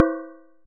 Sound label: impact Bell heavy; Kenney's Sound Pack; Impact Sounds